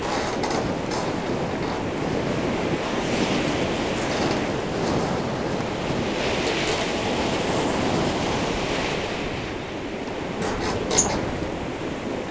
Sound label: wind cold creak interieur